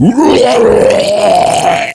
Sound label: Bloat Barf Attack; Bloat Santa